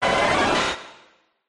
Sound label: cry